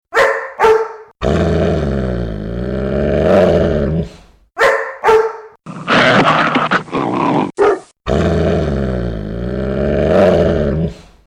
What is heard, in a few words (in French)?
aboie